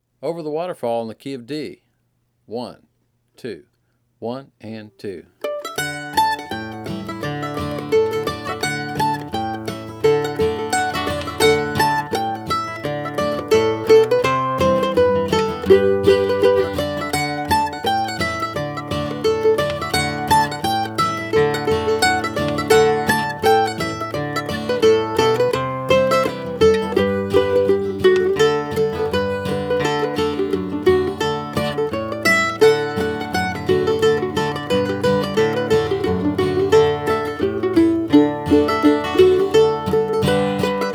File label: Over The Waterfall